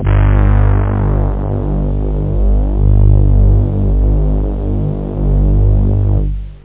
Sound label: hi synth; da capo vol1; instruments